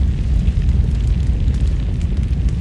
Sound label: burn tree